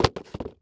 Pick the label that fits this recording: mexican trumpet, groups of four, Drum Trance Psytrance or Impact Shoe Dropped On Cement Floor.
Impact Shoe Dropped On Cement Floor